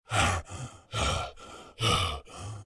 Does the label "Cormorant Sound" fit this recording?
No